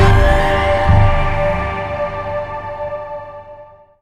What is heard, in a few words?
stinger; Mirror's Edge (2008)